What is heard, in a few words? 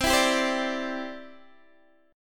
C-Major Add 9th, Guitar DADGAD